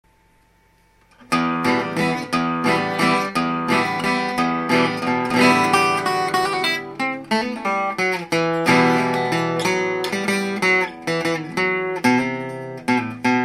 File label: Waltz